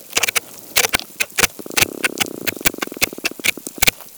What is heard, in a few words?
parrot fish eating coral sounds